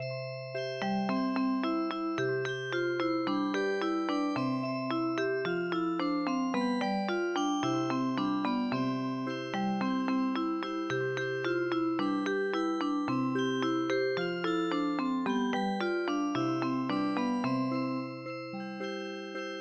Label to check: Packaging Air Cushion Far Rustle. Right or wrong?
Wrong